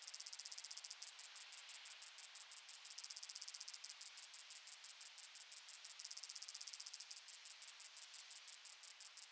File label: grasshopper loop; Golden Crayon Sounds